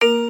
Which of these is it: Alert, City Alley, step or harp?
step